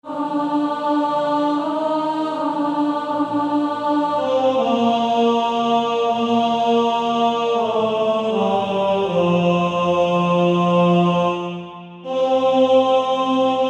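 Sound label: Male Choir, tenor 1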